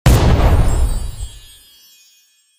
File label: Rainbow Explosion